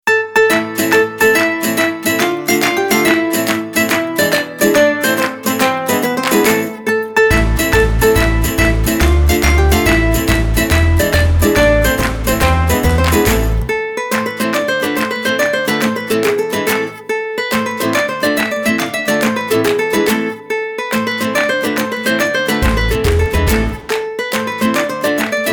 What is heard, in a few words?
italian tarantella, mandolin